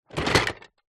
leisure toy train wooden track pieces in plastic box movement